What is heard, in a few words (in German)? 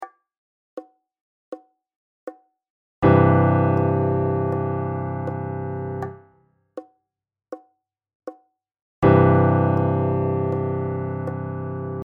keyboard rechte hand hoerbeispiel